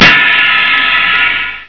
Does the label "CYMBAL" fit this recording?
Yes